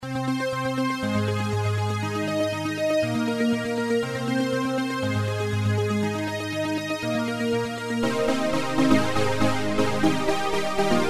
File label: leads